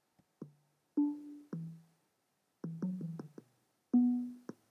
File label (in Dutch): Muziekfragment Nederlandstalig